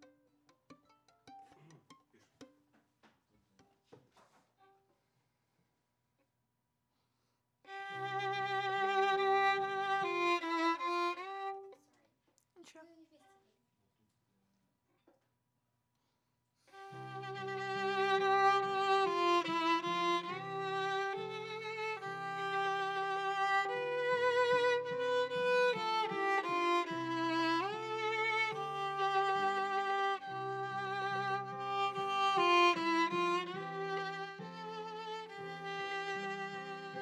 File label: Viola D